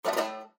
Windows Critical Stop; Windows 7 Sounds; Delta

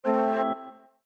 Windows Battery Low; Windows 7 Sounds; Characters

